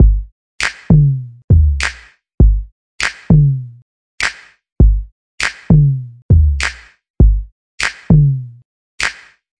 100 yinyang fortune cookie snapper, drum loops and breaks; 091 to 100 bpm